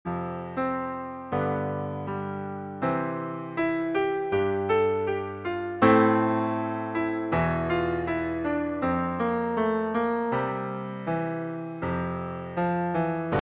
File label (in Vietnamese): guitar canon nhe nhang